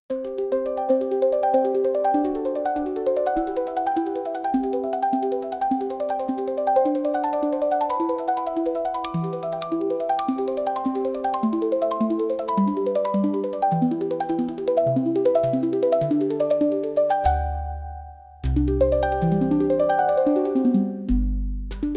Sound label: handpan; handpan volume 10 spirit